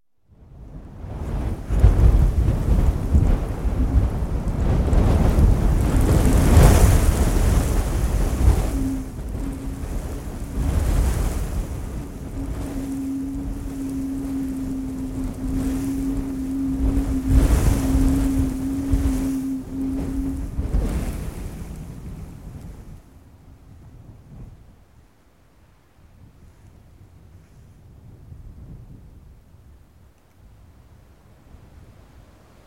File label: Heavy Gusts Wind Moaning Wind